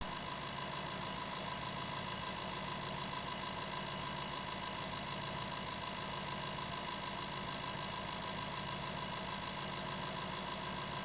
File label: 5.0l non sc engine noise, Jaguar XK; xkr x150